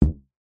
rubber band large ping twang thud; foley; zapsplat

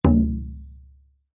instrument drum large gamelan hit single

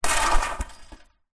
sport soccer ball goal score ball hit goal net

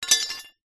animals horseshoes x2 metal down on hard ground

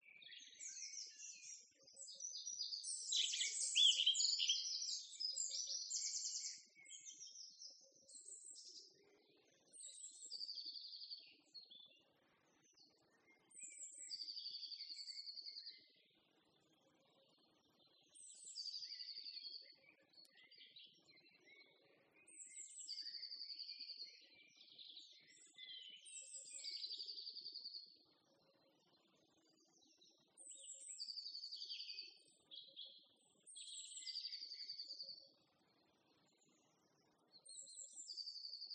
Countryside Spring Morning Birds Insects

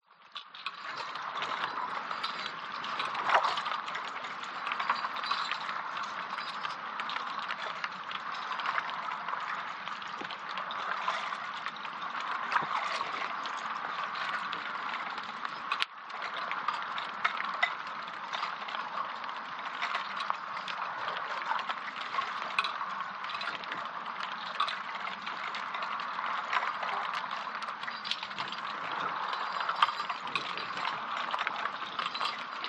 chain rattle movement underwater waves hydrophone

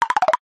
cartoon wood knocks fast crazy

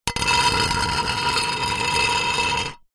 glass sheet small square scrape concrete; foley; zapsplat

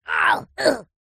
cartoon voice high pitched pain grunt

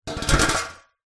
household grater metal fall over

